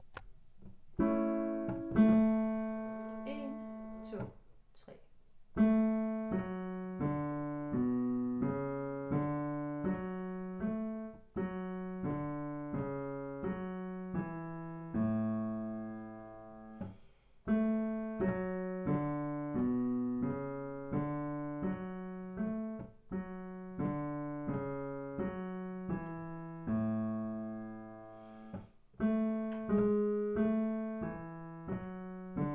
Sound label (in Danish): BAS uden sang